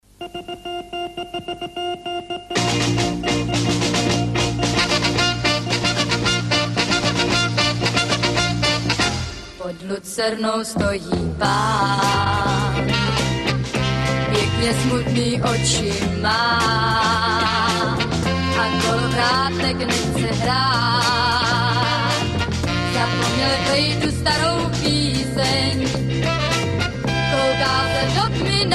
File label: music box